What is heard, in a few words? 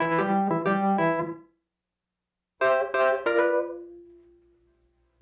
Call And Response Wurly A, 70s Analog Funk; Sonic Foundry (Sony Creative Software); What it Is!